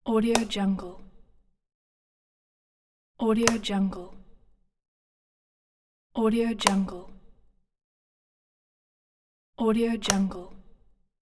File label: switches